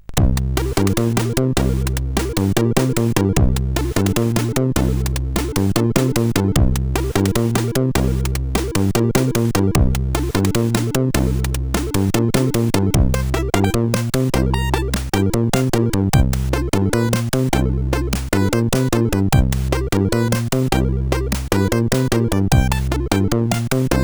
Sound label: Jazz, sid CSG8580R5; Spike